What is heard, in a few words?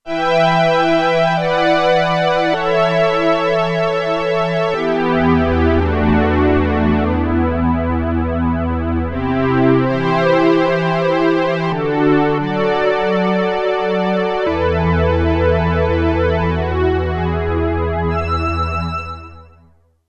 Juno String, Roland Alpha Juno-2; Original Sound Chart patches